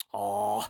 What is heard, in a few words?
Map Open; magic maid cafe